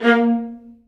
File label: Vla A#2; Digital Sound Factory Virtuoso